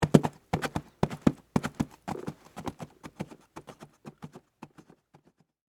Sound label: Horse Gallup, Hooves, Away; Animal; Horses; Animals